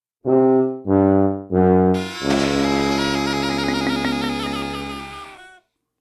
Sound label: Losing